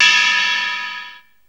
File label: GONG; BEAT2000